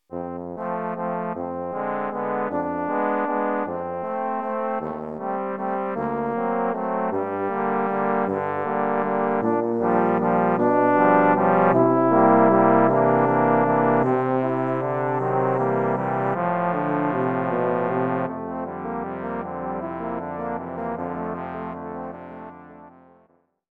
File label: The Organ Glinder Sings